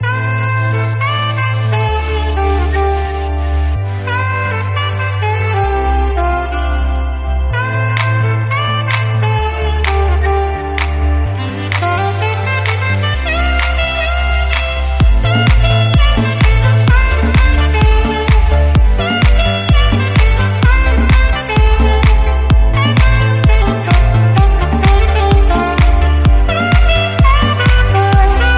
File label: Huawei Ringtone; Huawei Mate 10 Lite